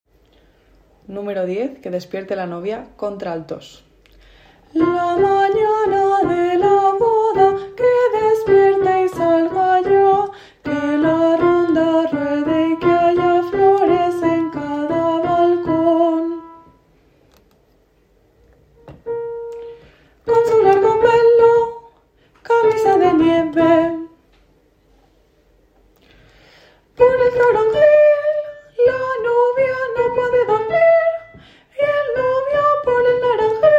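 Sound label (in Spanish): Que despierte la novia alto; Bodas de Sangre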